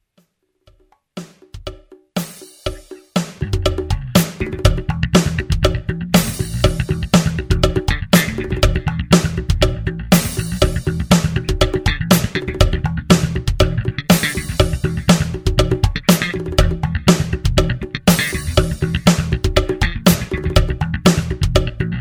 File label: funky track